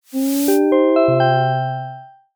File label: uplift logo resolve